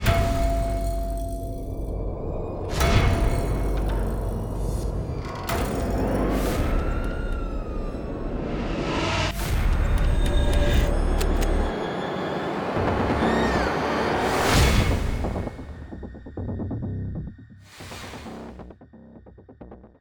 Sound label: SFX; Fatal Attraction Generic